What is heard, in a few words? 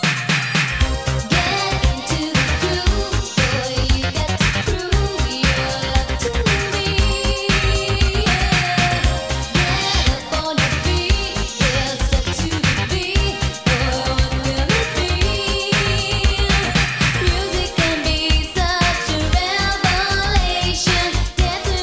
groove